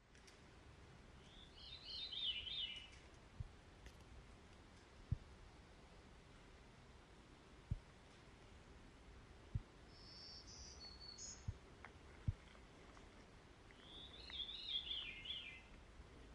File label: Veery